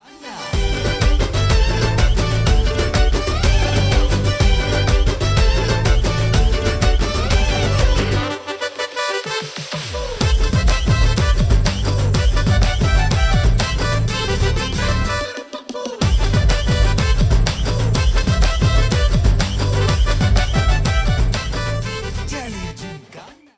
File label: BGM Ringtone